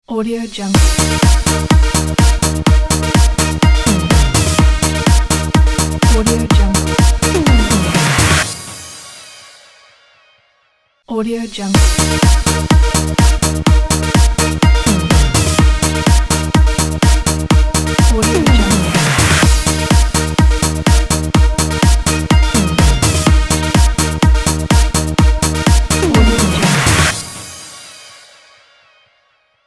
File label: upbeat intro pop logo